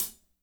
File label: HAT 6-L, DRY KIT; Spectrasonics - Burning Grooves CD2